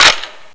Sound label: foley; cstrike